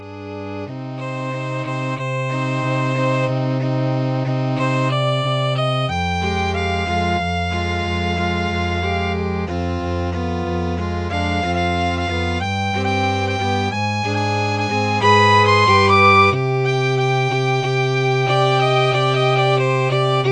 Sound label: string quartet